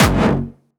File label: Attack Noize, Jumpstyle Kick; vengeance essential club sounds-3; VEC3 Bassdrums